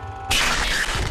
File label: sinister static